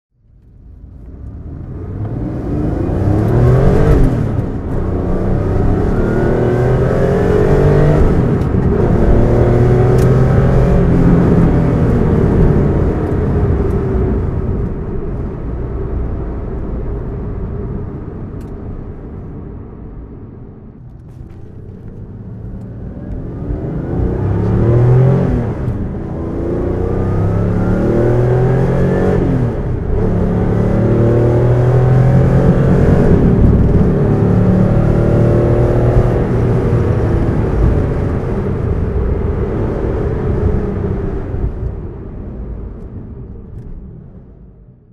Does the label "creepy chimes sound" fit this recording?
No